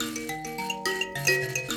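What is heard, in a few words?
grand mbira phrase, African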